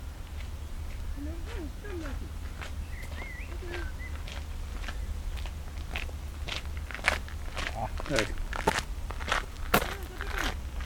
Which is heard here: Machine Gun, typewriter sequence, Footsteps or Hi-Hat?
Footsteps